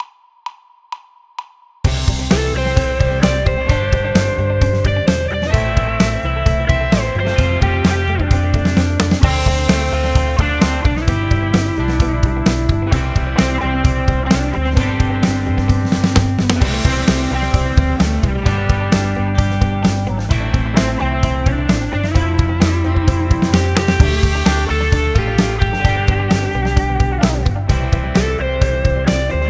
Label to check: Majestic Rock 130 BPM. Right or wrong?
Right